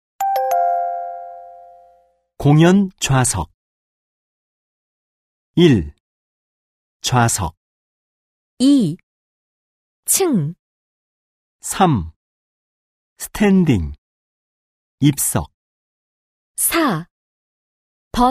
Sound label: K-POP